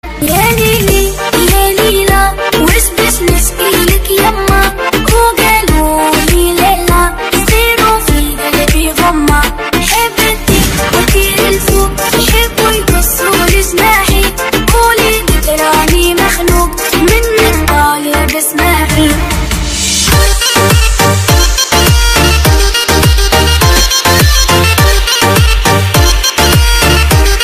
best arabic ringtone; android

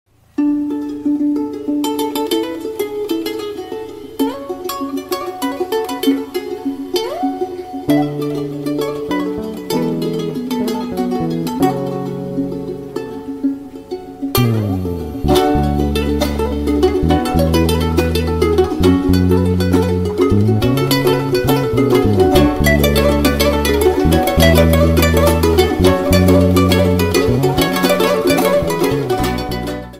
viral ringtone

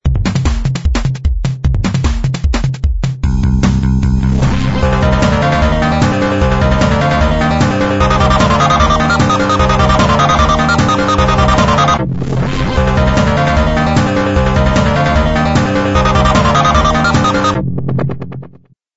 chain link ringtone; motorola

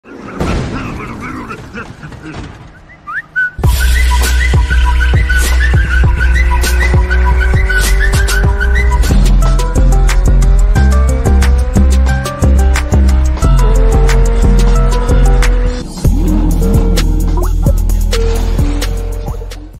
whatsapp ringtone

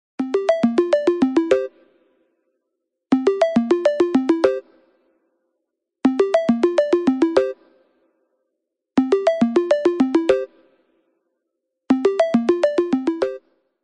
incoming call ringtone; snapchat; android

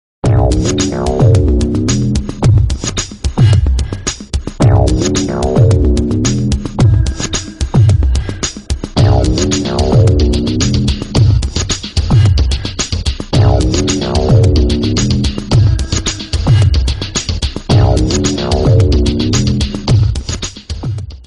dark emotion ringtone

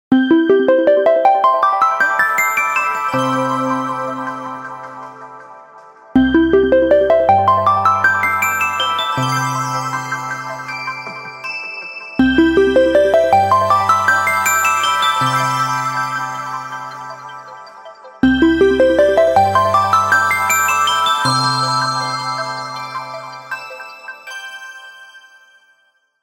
tranquil ringtone; oneplus

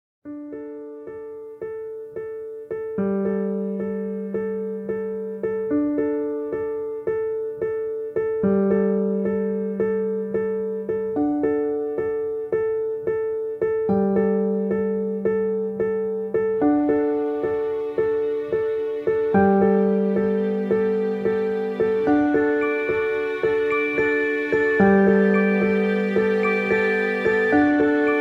ringtone

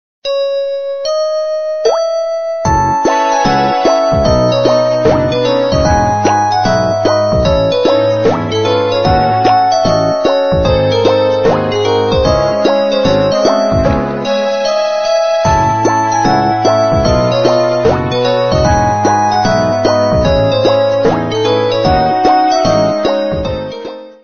korean cute ringtone

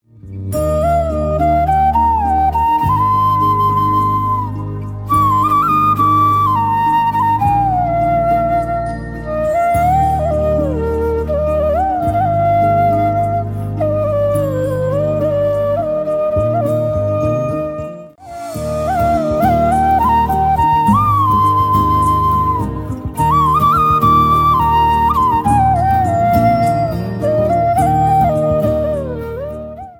krishna peaceful flute ringtone; android

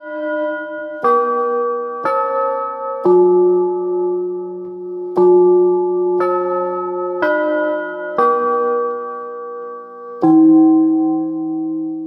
big ben bell ringtone

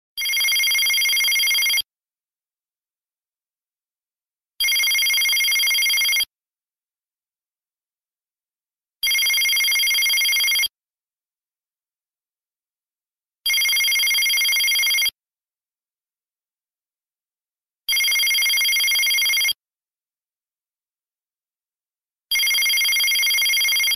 nextel ring ringtone

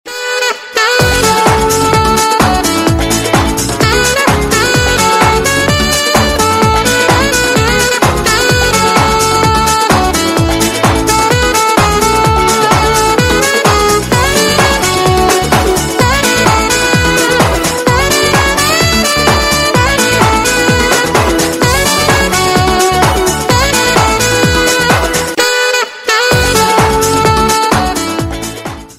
saxophone ringtone; android

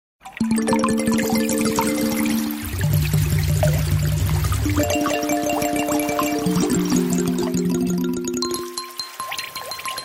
water ringtone

